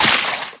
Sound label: Enemy Pirate Minion Active